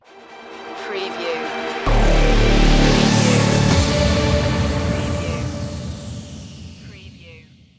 electro symphony stinger

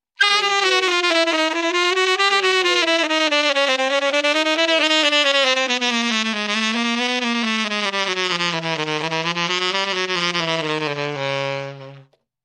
Crazy Long Sax Run

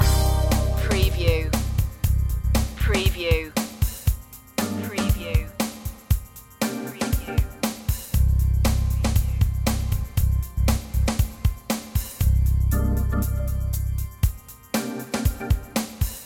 city blues loop

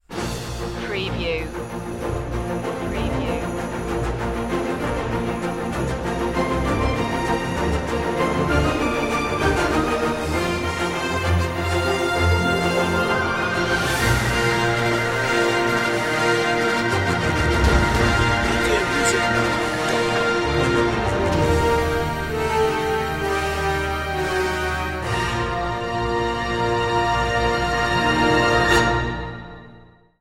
ending no choir

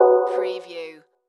Multimedia Error

